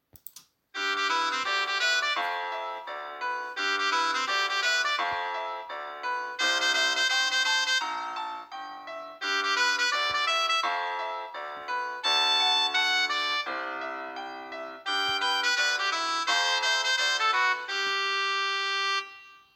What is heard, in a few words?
Swing; Lock-Down